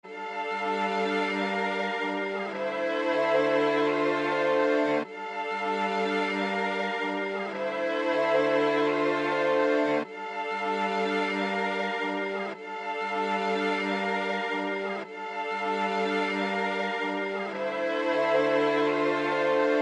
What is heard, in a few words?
192bpm